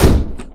door slamming

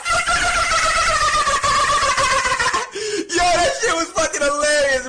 laugh meme; flight

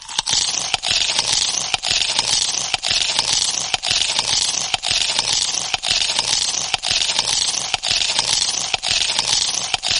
eating; plants vs zombies

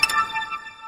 chime; after effects

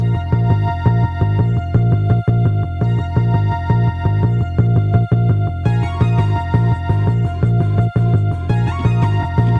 speedrun music

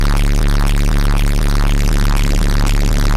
bass boost

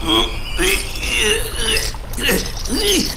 struggling sound; booba

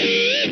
censor; metalocalypse

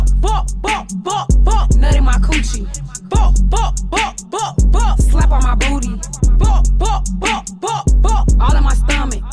bop bop bop

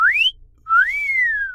cat call whistle

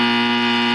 error

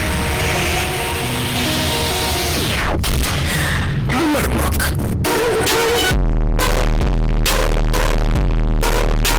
bass; soundpad

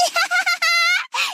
nihahahaha

